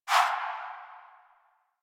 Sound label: Fast Travel Map Close; Warframe; Open World Fast Travel